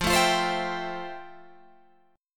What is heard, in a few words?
F Major 9th F, Guitar, Open G